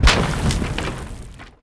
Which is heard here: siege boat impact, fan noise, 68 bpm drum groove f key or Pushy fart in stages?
siege boat impact